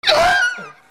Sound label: ANIMALS